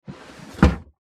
Stove Drawer Close, Stove Drawer Close Antique; Household; Historical Antique Appliance; Appliances

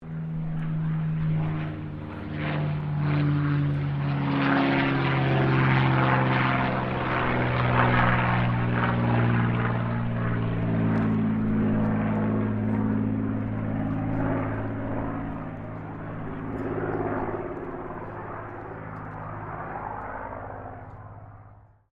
AIRCRAFT CAC CA-18 MUSTANG MK23 PASS SLOW; CA-18 /P-51 Mustang; Airplanes (Sound Effects:Vehicles)

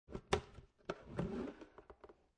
Cooler Open Small Cooler

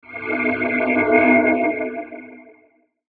Mouseover Chorus Swell; Multimedia; Blastwave

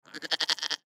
Goat Bah Choppy; Farms; Backgrounds; Farm Sound Effects; Animal

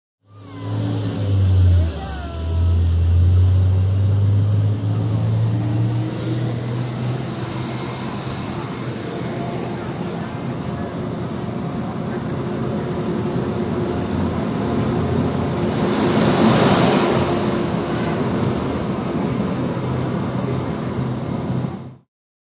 Shttle Ride, Sounds of shuttle driving.; Shuttle Bus Ride 1; Busses; Vehicles

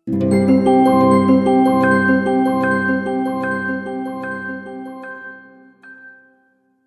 Cartoon Game Treasure Revealed; Cartoon Sound Design Sound Effects

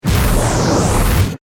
Whoosh Hit Complex; Whoosh, Ghostly, Breath, Horror, Production Element, Imaging Element, Accent, Transition; BlastWave

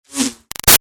Arrow By Zip Ruffles; Bows and Arrows; Combat; Big Room Sound

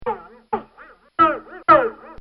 Cartoon Sound

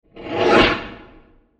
Screechy Metal Transition; Scary Soundscape; Horror; Crafted Signals

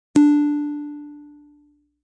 Dong; Dongs; multimedia

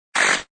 Video Game Sound, Video game sound; Part of Video Games (Sound Effects:Games)

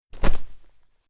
Cloth Character Movement Foley Whoosh

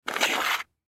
Coin Pick Up Single Pirate Gold; Money; Foley; Objects; Blastwave

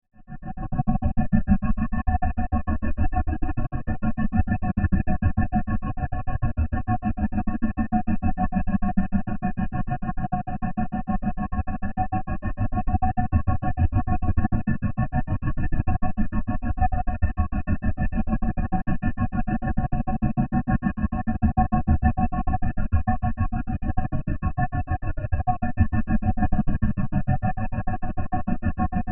Science Fiction Alien Power Drone Modulation

